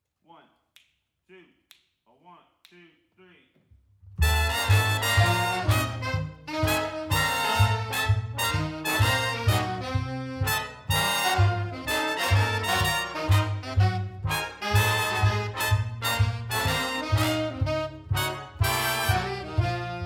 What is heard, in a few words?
Drum Track